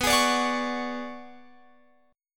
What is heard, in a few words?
B Minor 6th Add 9th B, Guitar Open F; Bm6add9 strummed